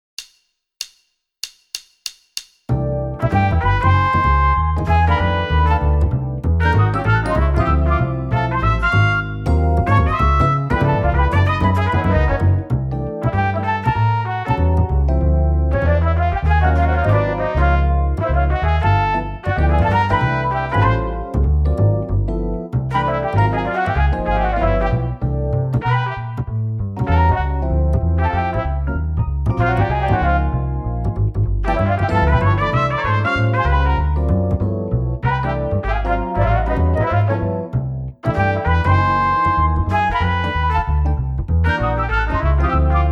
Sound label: Backing Swing Jazz